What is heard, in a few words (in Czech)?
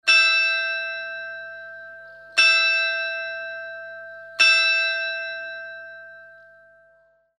zvon dar obce krtiny; zvonohra